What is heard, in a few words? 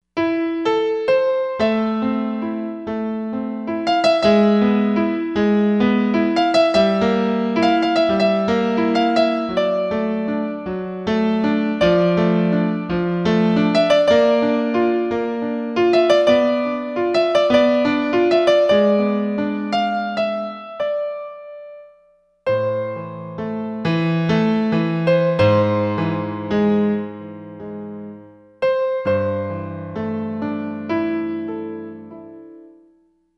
Nice Piano